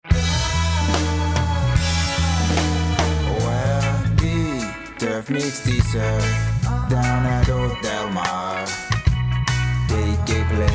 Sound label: Reggae